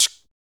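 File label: vox percussion, Vocal Planet Jazz FX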